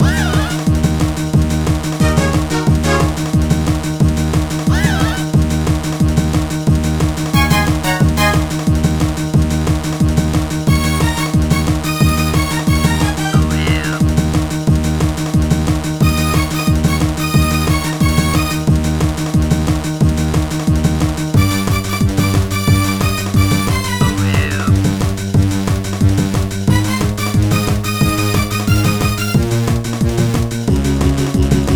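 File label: background music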